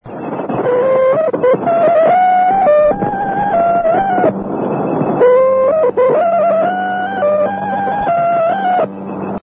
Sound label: usb